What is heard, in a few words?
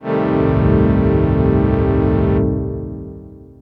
syn orch, dx7